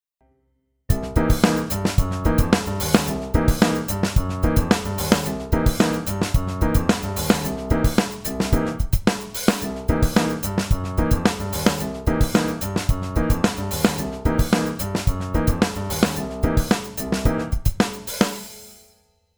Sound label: funk riff drums; piano